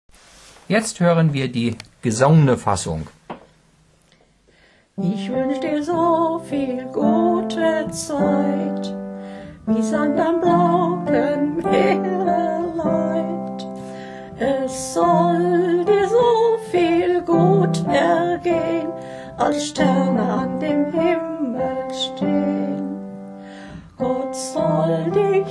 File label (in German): Gesang